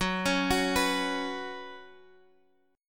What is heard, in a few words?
F sharp Suspended 4th, Guitar, Half Step Down